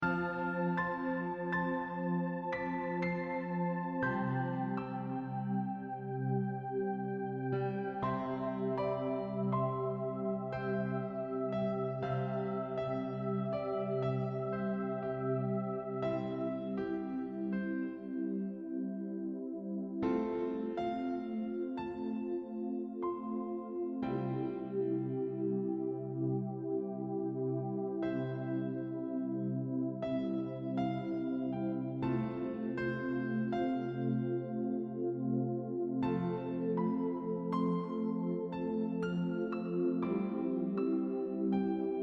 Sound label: Silence and Calm